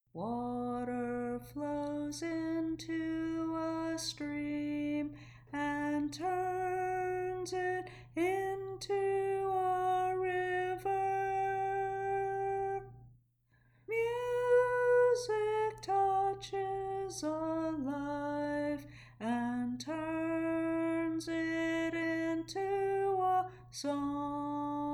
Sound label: HIGH